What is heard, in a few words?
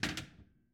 Pinball Ball Drop